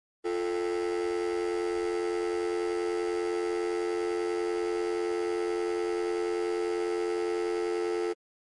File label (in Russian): phone